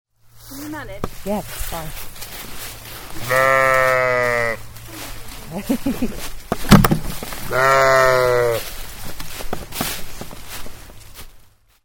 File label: baa